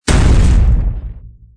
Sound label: rock Drop